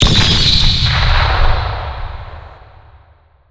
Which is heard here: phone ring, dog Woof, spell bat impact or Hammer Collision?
spell bat impact